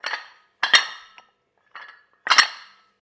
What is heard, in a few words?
dumbbell, gym; Ambience; gym heavy